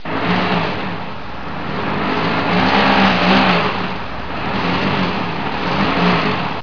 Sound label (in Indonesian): suara mobil